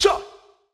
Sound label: Raggae Raggaeton; Jah